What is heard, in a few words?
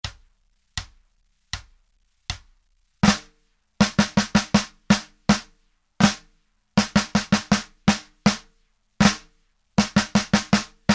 Flams